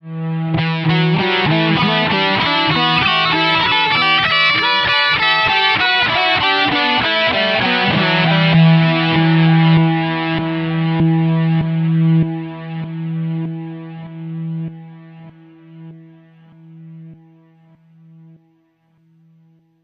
timeline reverse; Damage Control

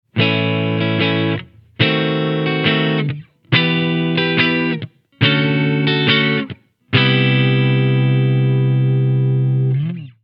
demonizer warmed up; Damage Control

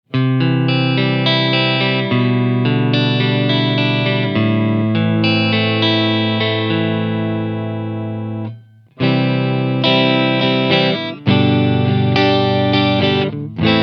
womanizer clear; Damage Control

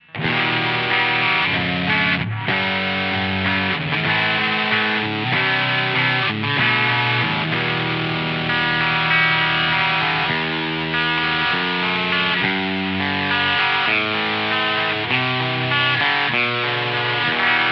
liquidblues layered; Damage Control

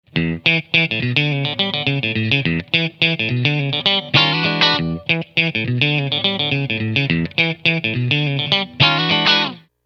womanizer funk; Damage Control

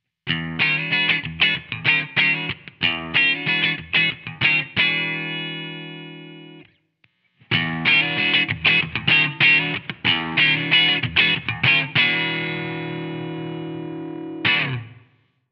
liquid blues clean dirty; Damage Control

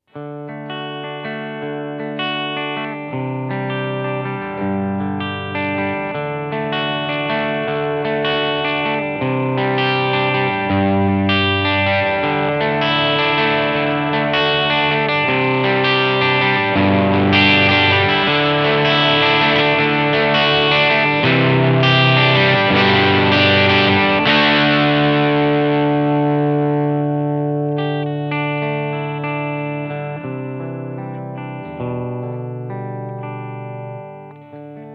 womanizer dynamic; Damage Control

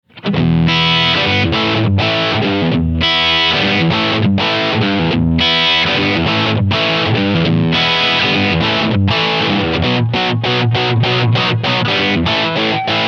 womanizer telerock; Damage Control

